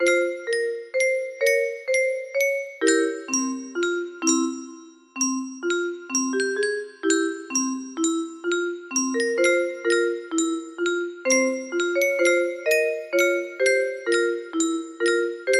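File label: music box melody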